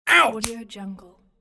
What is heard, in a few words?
man yelling ouch with arm slap